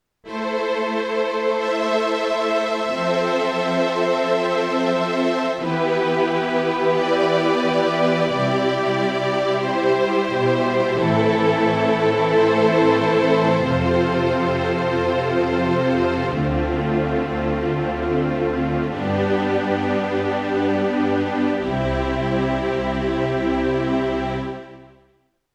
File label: Alesis Recital 61 Strings